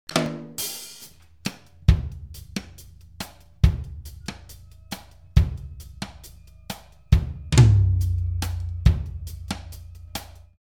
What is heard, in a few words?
Dry Drums